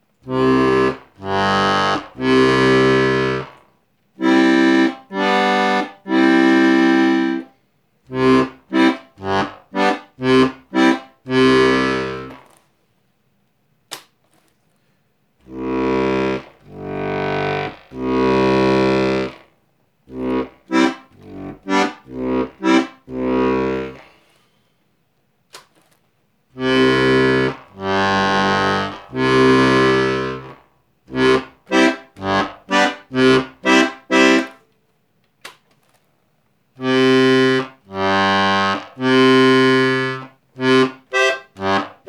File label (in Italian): bass seite scandalli conservatorio; bjp 442s; Stradellabass